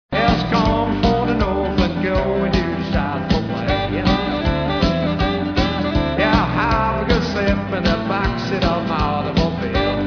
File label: band